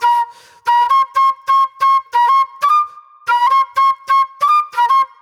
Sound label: Soul-Jazz Flute, Call And Response; Sonic Foundry (Sony Creative Software); Manhattan Flutes